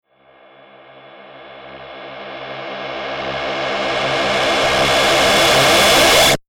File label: Reverse Distorted Long Evil Robot Scifi Space Metal Machine; Sound Design; Crescendo